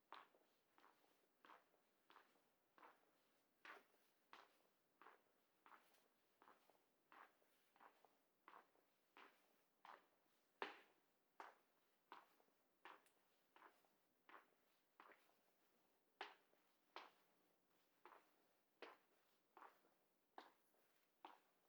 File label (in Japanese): 廊下を歩く(図書館); 足音系